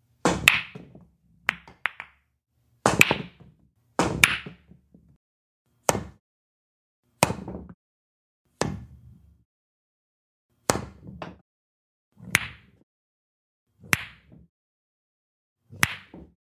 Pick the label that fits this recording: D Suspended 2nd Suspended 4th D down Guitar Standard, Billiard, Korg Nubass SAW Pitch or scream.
Billiard